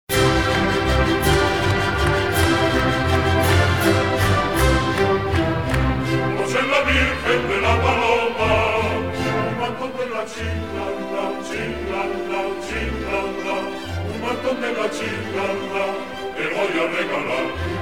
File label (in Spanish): verbena; paloma